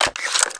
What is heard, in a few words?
g3sg1 clip out, weapons; cstrike